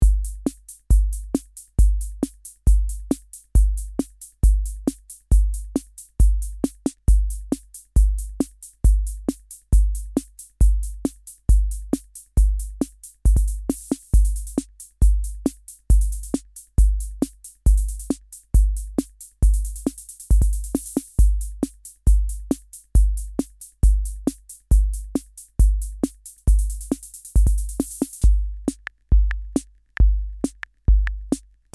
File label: Clef Rock Beat